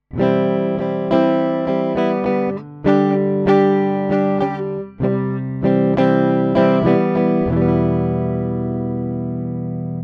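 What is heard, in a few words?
HiZ Clean, piano; Basic BLA B12A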